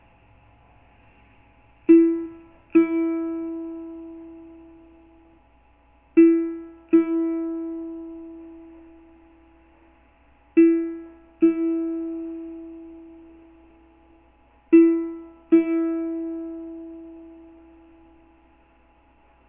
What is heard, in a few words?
ukulele MI